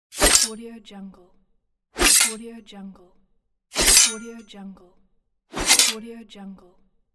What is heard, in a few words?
dagger on metal; audio therapy